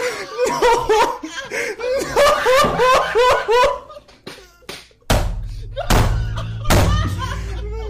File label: crying; callmecarson